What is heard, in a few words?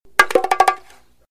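bongo chime fast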